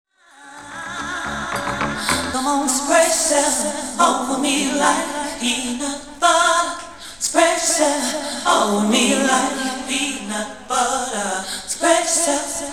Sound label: PAD